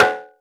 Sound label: Metalica